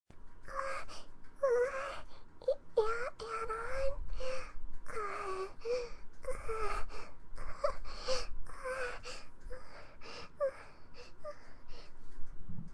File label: moaning; Fluttershy